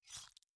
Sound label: gore dash